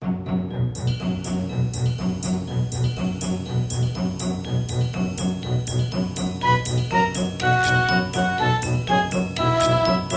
Mundo risa Intro; DANZA

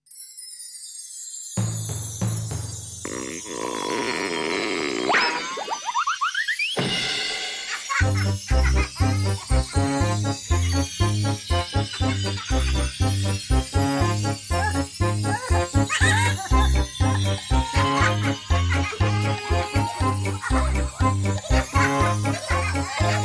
Mundo risa

